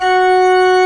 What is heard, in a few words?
CH F#4, CHURCHY; East West; Global Sounds